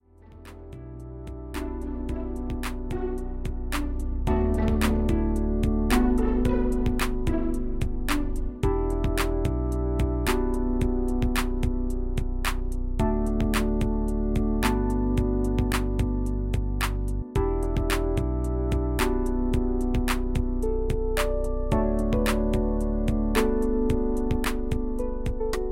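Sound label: Victory Instrumental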